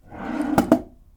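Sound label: hotel drawer open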